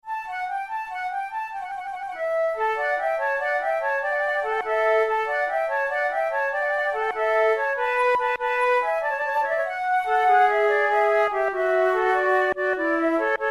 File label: gigue, flute